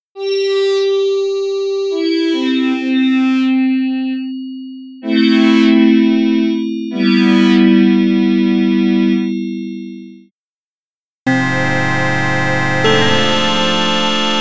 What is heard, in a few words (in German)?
Synclavier Partials Kombinationen